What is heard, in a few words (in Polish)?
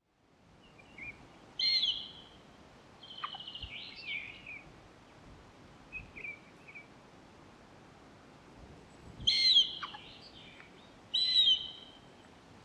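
błotniak stawowy circus aeruginosus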